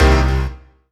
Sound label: Synths C; Vengeance Samples